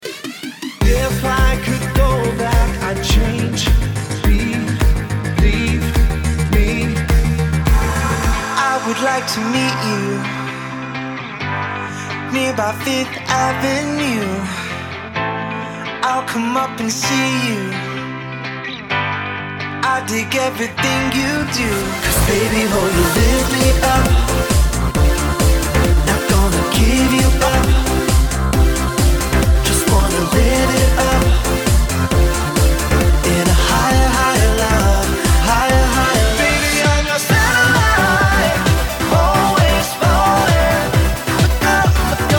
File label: pop